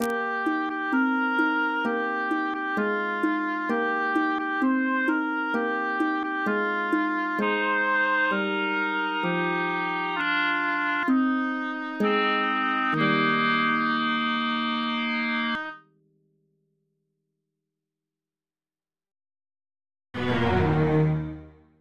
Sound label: Waltz